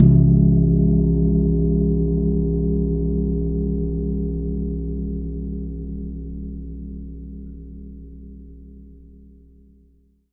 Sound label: Long Gong Single Hit; Longevity Gong; ETHNIC and WORLD PERCUSSION; Longevity Gong by Amani